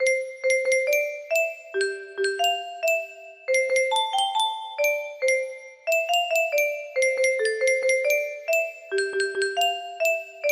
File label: melody